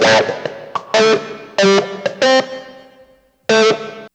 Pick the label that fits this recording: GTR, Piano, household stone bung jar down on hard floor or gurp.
GTR